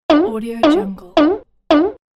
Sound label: cartoon jump